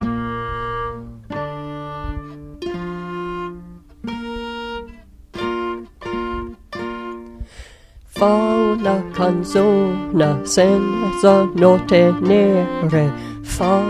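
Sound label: Tenor Words; Fa una canzona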